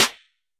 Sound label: Mini Trap Snare; Nova Sound